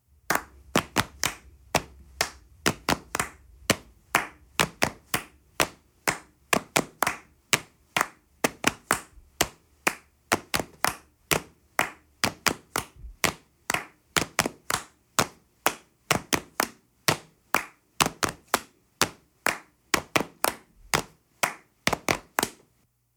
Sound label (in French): percussions; le lionceau